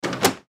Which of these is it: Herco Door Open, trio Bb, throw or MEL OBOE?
Herco Door Open